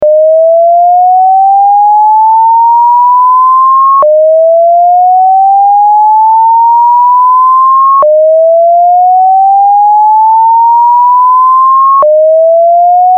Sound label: Whoop